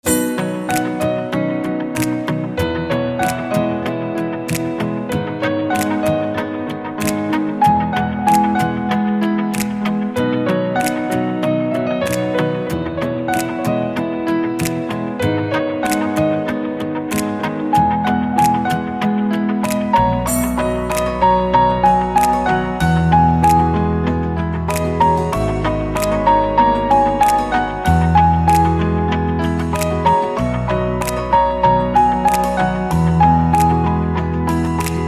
Piano

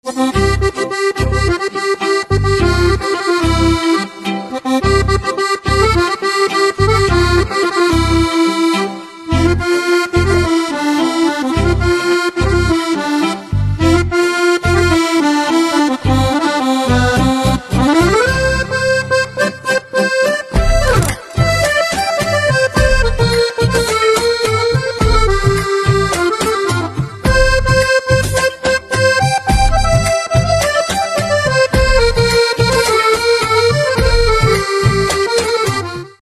Accordion, instrumental ringtones

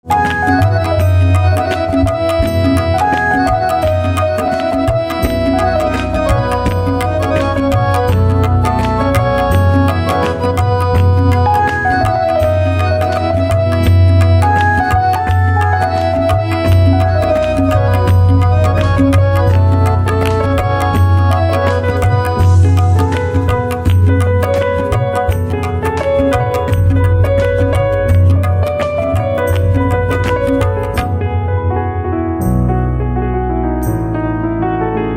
Piano

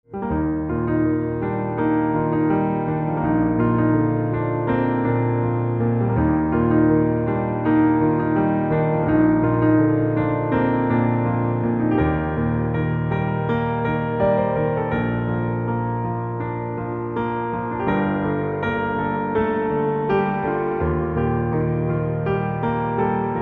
Piano

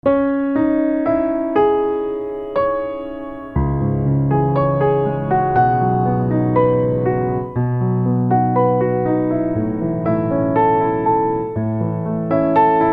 Piano Silent; instrumental ringtones

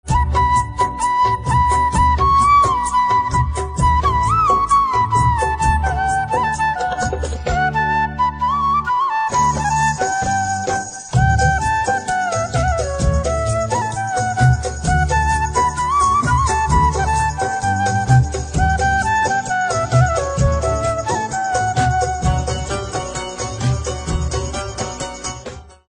Flute Instrumental Ringtone

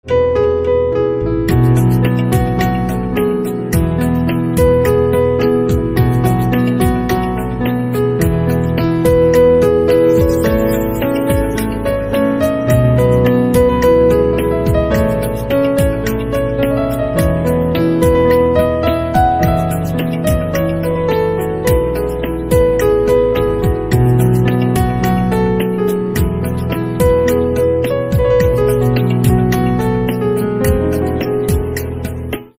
Piano Instrumental Ringtone

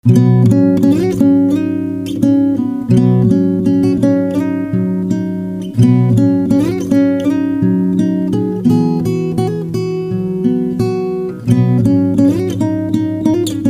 Guitar

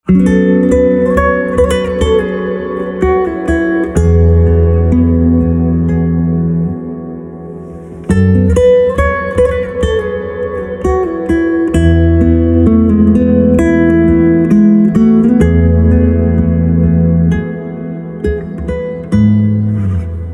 Guitar Silent

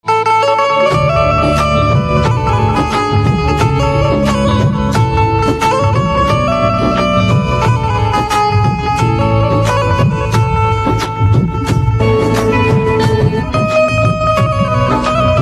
Banjo, instrumental ringtones

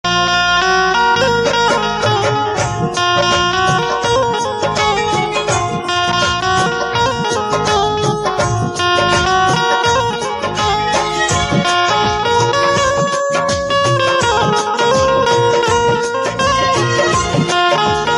Banjo

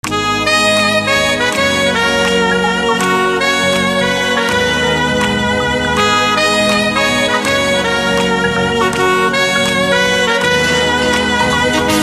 Saxophone Ringtone

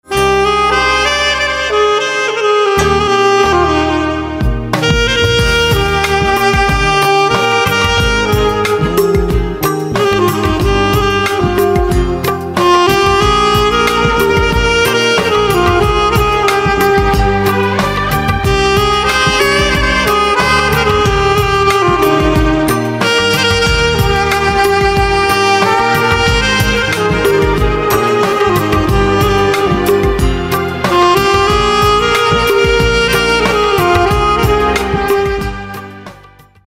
Saxophone, instrumental ringtones

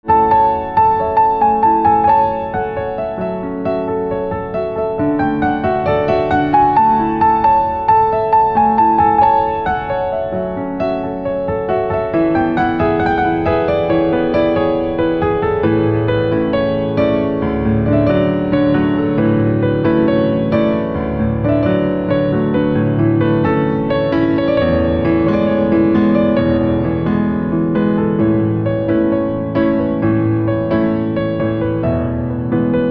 Piano; guru

